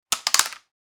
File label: Headphones falling on surface soft impact; headphones and speakers